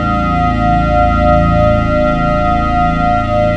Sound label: forcefield; trek